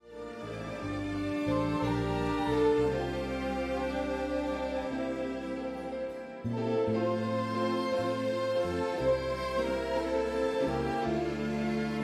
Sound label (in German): Orchester